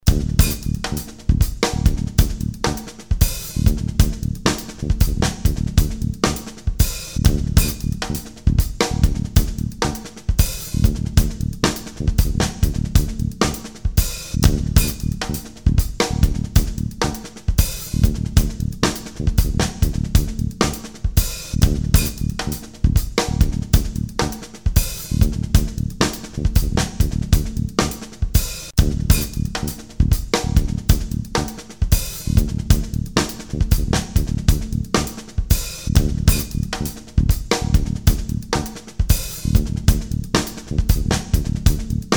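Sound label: D Pedal, Ear Training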